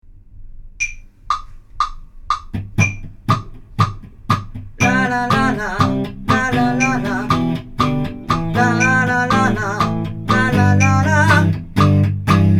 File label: vocal